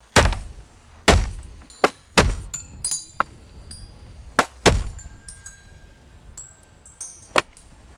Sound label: dull low intensity wood chopping impacts